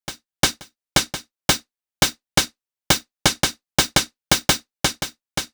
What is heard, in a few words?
Hi-Hat, Hi-Hat Loops; KJ Sawka - Drum n Bass & Beyond; Drum Tool Box